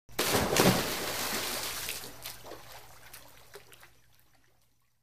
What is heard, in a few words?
Swimming Big Dive Sp